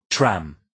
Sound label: tram